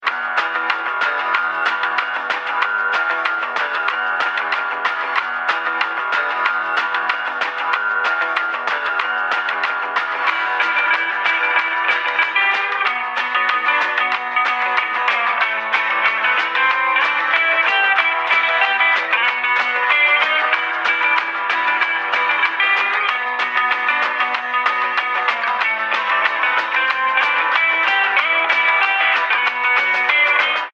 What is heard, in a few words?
menu mus; Weird Hero